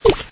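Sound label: goblin step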